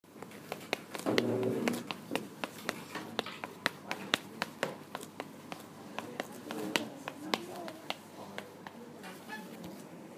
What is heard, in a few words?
run in medium speed